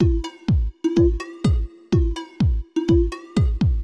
drum loop house 125; eJay

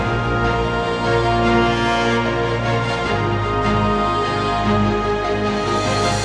strings; Babylon 5; 1st season

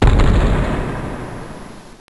Wall Slams; Death Blade

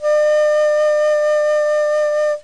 Flute; DNA Teacher 1.2

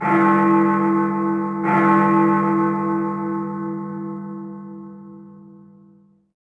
Big Ben; buildings

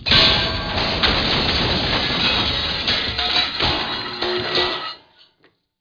BIG CRASH

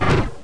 flare; teracide

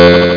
Eject; Eat My Photons!

